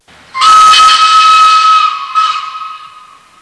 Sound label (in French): sifflet